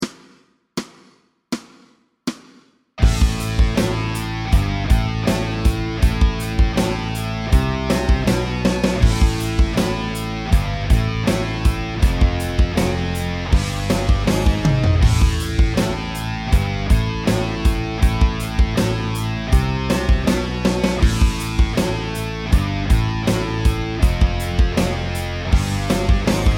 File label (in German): Backing Track Leadgitarre